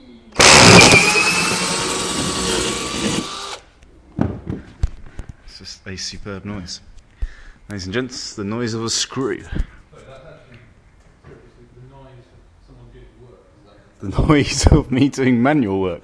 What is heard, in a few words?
screw noise